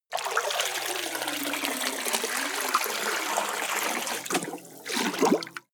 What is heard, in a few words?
pour and splash